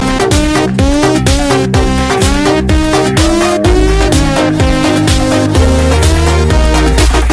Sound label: beat, ringtone; vaanam; blues